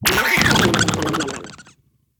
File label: e skill duck run